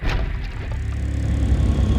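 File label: Music Volcano 120; from dust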